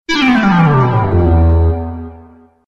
text jingle

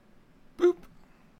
boop

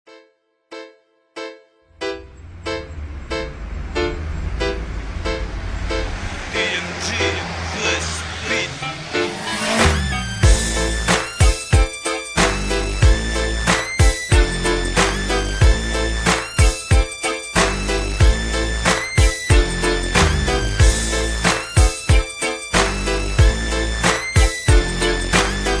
g-funk beat

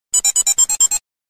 Digivice Single Beep

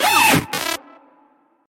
Electronic Scream

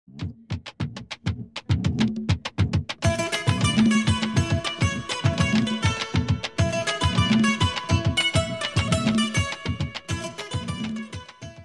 Caribe SMS

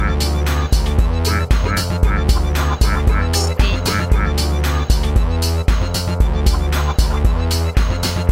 Beat

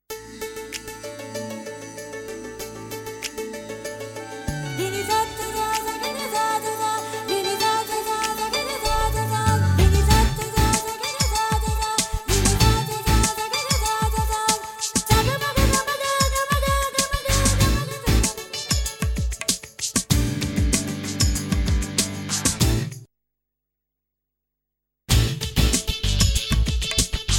rintone

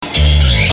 Pick bass

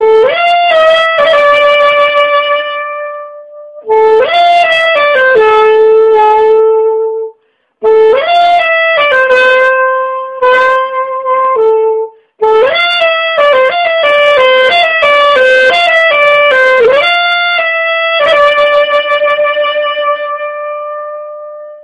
Saxophone musical

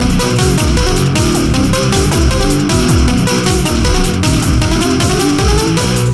rock; pac man

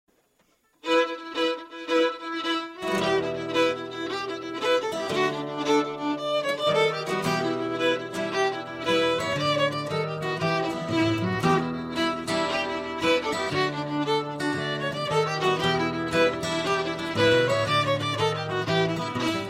jig

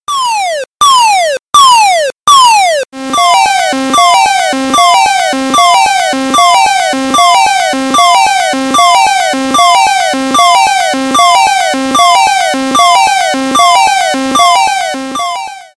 Galaxy S4 Alert Tone

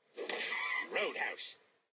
alert

